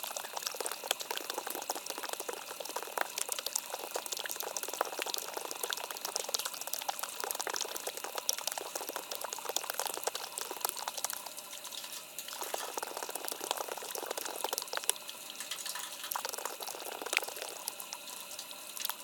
FOUNTAIN Small Concrete Drinking Dispenser Dribbles Close